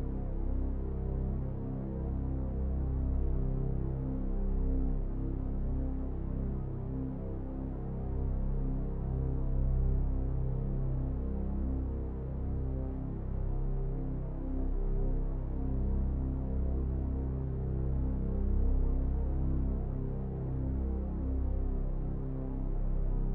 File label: Three Drone Loop; Void Bastards